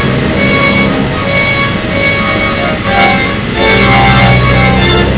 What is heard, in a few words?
TV Set Playing the Piano, video installation; Prometheus